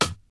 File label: 00s clicky hip hop steel snare drum a key, Royality free acoustic snare sample